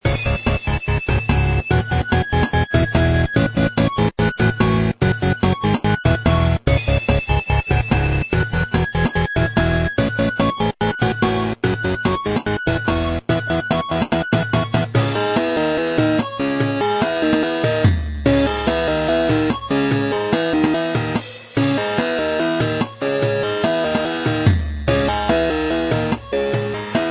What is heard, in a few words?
faint 8bit